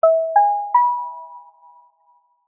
multimedia alert prompt feedback tone simple sine chime 2 tone